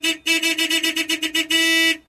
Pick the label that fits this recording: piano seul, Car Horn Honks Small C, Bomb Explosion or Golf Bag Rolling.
Car Horn Honks Small C